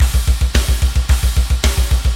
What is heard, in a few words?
metal